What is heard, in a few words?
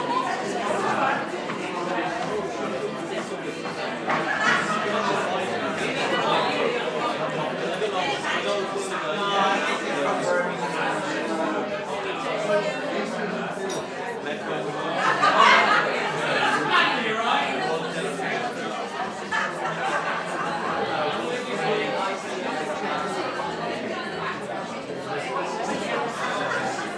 ambience crowd in a bar